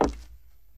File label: wood, player footsteps; hl2